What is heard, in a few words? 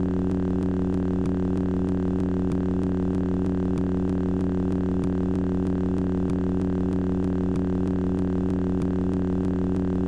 lightsaber pulse